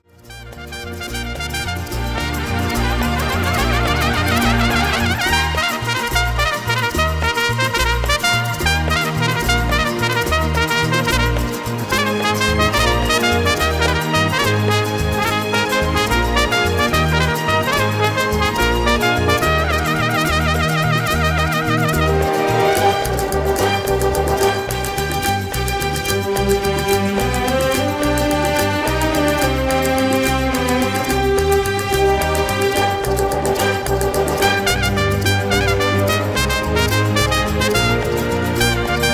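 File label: trumpet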